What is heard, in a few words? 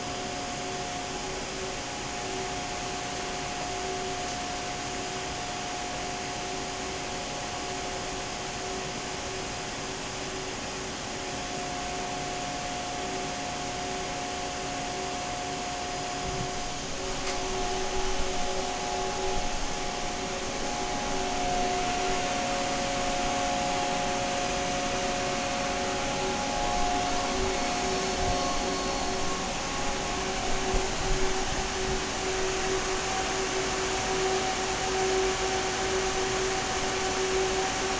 Machine